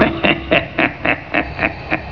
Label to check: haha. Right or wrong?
Right